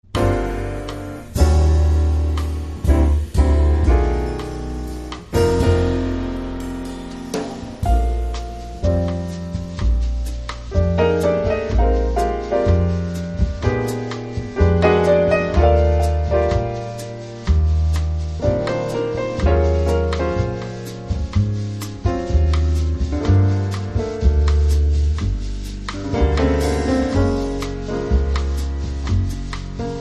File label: samba jazz trio